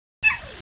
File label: yip, fox